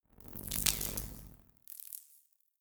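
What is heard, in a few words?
organic scuttle short, science fiction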